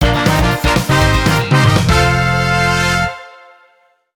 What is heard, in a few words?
Music event; Power Pro Kun Pocket R